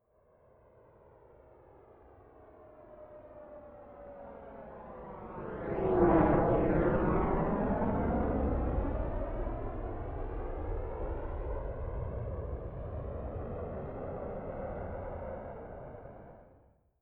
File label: military jet fulcrum flyby right to left distant